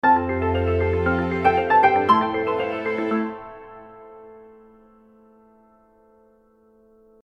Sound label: sound logo